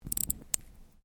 Watch Clockwork Wind, Click; Objects

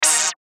CHIME SWEEPER; Blastwave

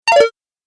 Message Alert, beep

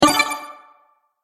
Sparkle Strike, magic sound effects

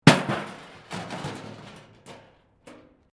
metal rubbish bin smash concrete; Materials

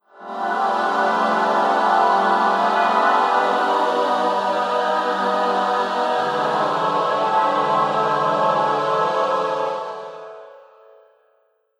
Dissonant Interlude; Musical Sound Effects

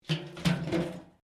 Pipe Tin Move; Metal; Materials

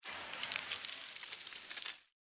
Science Fiction Ice Freeze Super Power Short; Battle

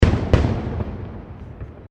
Fireworks Multiple Explosions; Fireworks (Sound Effects:Backgrounds)

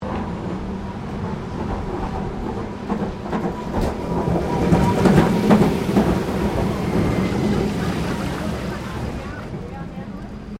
Tram Melbourne Old Stopping; Melbourne Trams; Streetcars (Sound Effects:Vehicles)

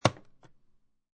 Household, Alarm Clock, Hit Snooze Bar; Electronics; Alarms

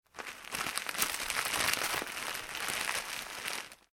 Plastic Packaging Movement

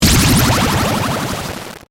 Distortion Sweeper; Production Element, Imaging Element, Accent, Transition; BlastWave

